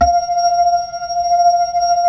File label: B-3, Hollow Ch; Roland LCDP10 Keys of the 60s and 70s 2